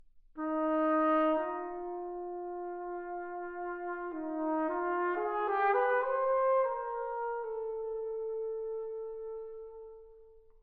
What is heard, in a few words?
Solo, Trumpet